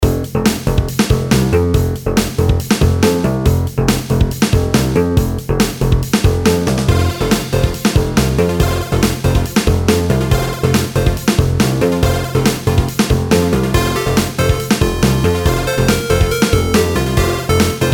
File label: chiptune dream